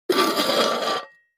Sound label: Coffee Can Scrape On; barrels buckets & cans